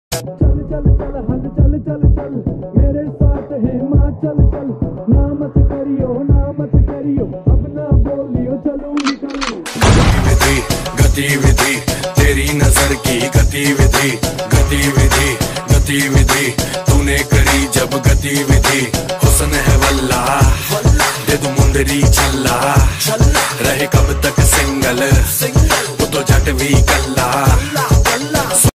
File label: slowed reverb hindi ringtone